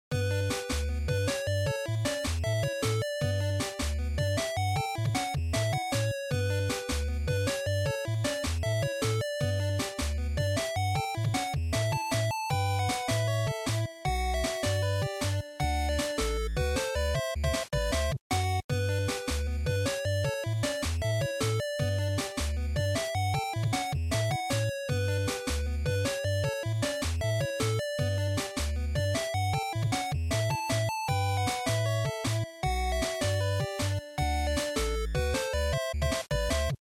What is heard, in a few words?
GB Sports Menu